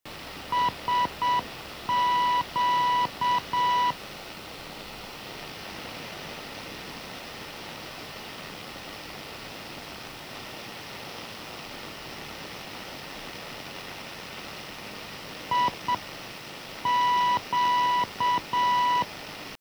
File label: radio